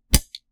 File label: Switch